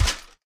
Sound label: footstep; smite; god sobek